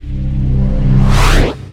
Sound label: Skill Looting; FlyForInsanity